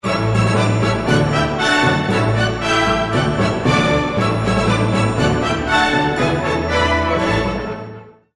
marche militaire military march; Schubert; Marche Militaire No. 1 (Military March); 1818; powerful

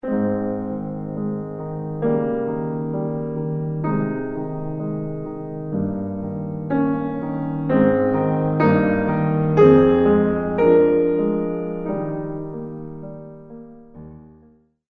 beethoven piano sonata 8 pathetique; Piano Sonata No. 8 (Pathétique): II; 1798; sad

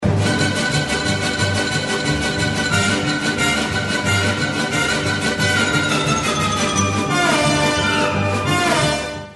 khachaturian gayane sabre dance; 1942; circus

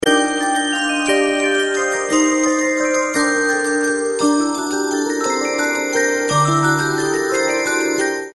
carol of the bells instrumental; leontovych

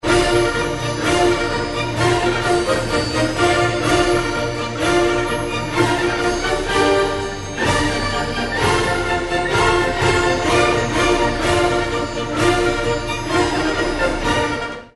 beethoven ruins of athens turkish march

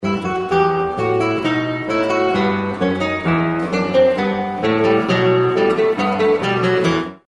bach bouree in e minor; Bourrée In E Minor; 1717; guitar

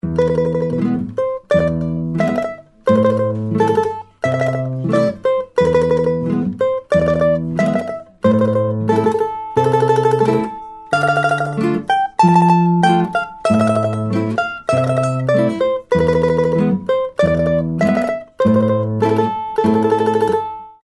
korobeiniki korobushka; Gypsies - Russian - Tetris

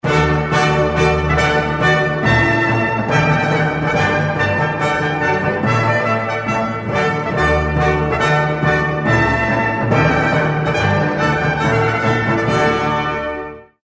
charpentier te deum prelude; 1698; fanfare

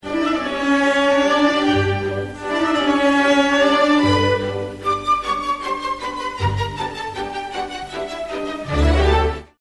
rossini thieving magpie overture; 1817; whimsical

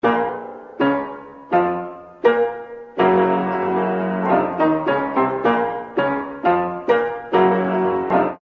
mysterioso pizzicato villains theme; Sneaky - Villian

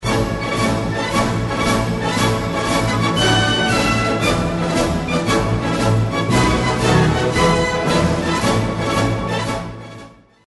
suppe light cavalry overture; 1866; horses

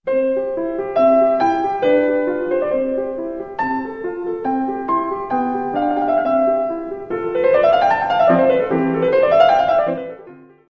mozart piano sonata 16

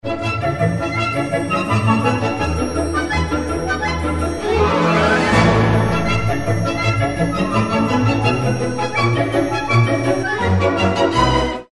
strauss tritsch tratsch polka; 1858; cartoon

